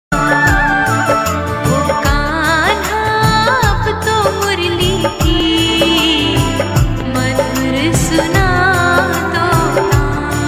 Radha Krishna bhajan ringtone

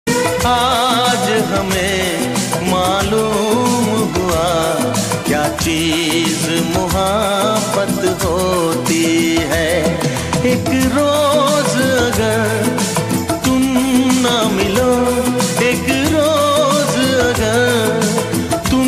song ringtone

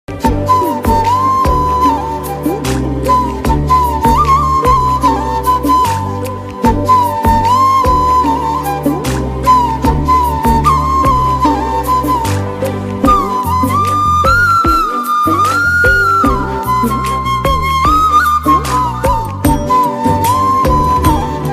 flute bgm; love portrait; tamil; vasanth edits

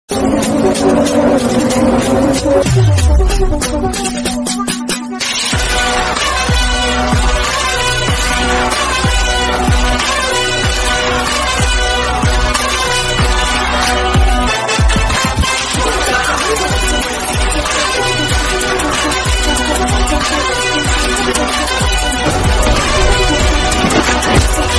telugu ringtone south ringtones

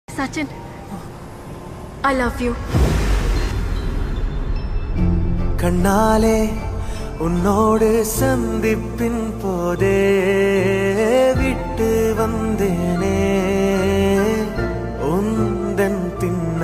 Tamil proposal bgm Tamil version song; premalu

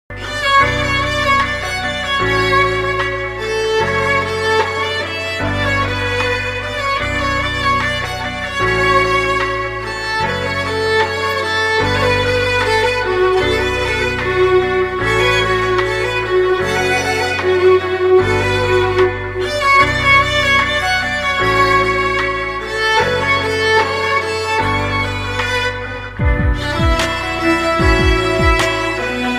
instrumental

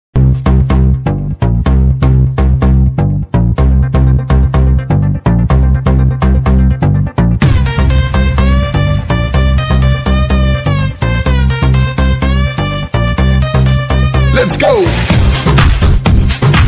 ringtone bgm instrumental ringtone; DJ movie

